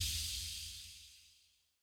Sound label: reel end snd; netent; twinhappiness